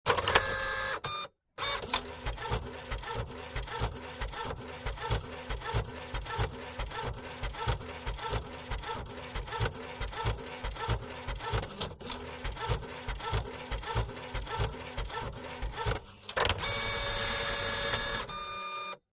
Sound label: Printer Ink Jet Print; fax scanner copier